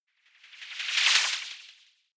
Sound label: whoosh simple flutter futuristic high